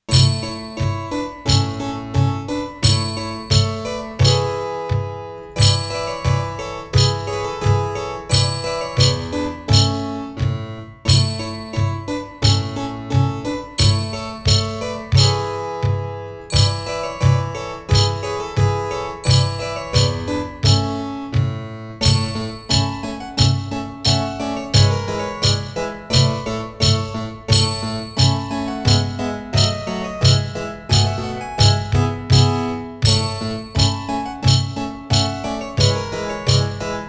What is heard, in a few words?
Rhythm Exer Dance No1; Ditters